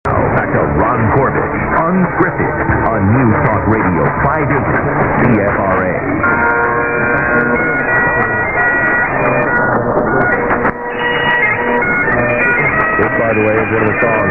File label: 580, mw dxing; cfra